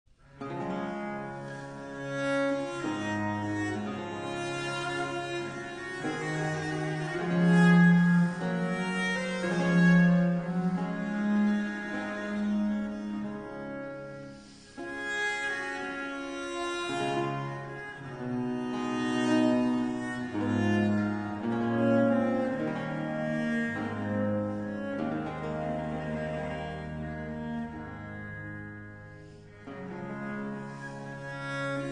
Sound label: sarabande; marais